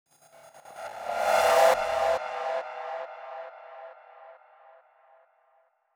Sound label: ReverseFx 138BPM; Sound FX; Gminor